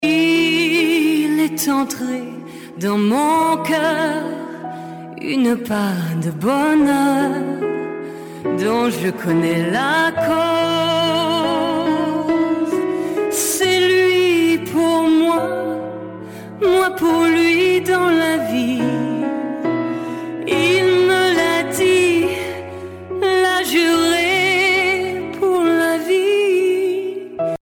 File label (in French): chant